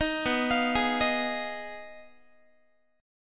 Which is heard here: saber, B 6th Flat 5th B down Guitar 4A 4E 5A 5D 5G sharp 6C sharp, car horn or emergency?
B 6th Flat 5th B down Guitar 4A 4E 5A 5D 5G sharp 6C sharp